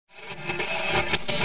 Radio Static; PS1, Windows; gamerip; The Game of Life

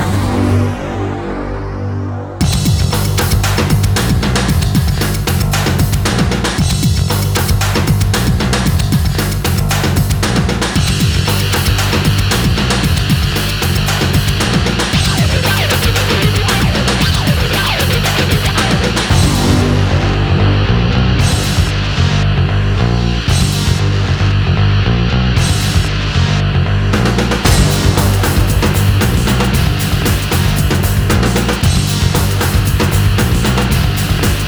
Bossfight; Solar Shifter EX

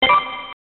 Coin; Hexion

